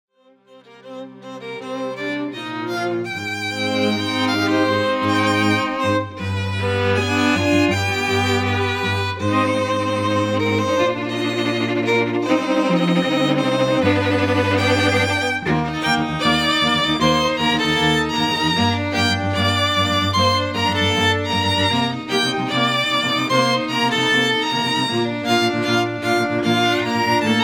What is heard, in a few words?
Simple Clean, wedding music